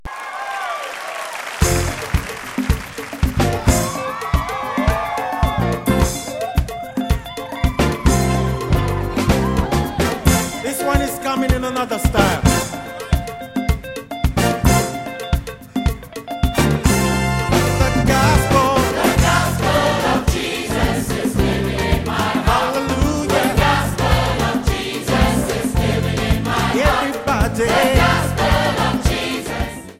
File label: Choir